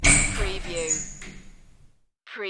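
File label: Old Shower Door Open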